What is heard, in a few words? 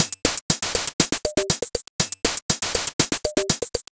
TECHNO